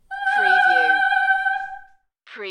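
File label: Female Opera Ah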